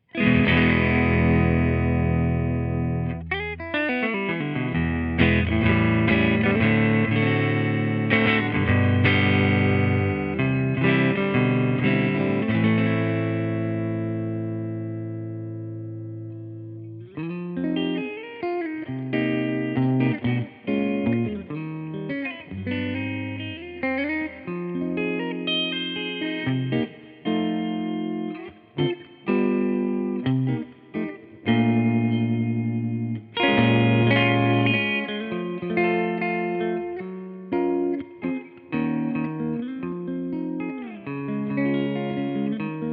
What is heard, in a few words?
groove tube